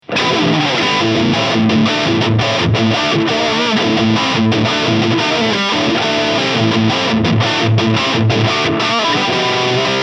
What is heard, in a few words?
Engl Powerball Distortion High Gain